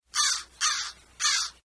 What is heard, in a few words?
corvus brachyrhyncho; animals